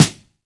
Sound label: Wet Snare Drum Sample D Key, acoustic snare sample tuned to the D note